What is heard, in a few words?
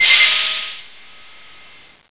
open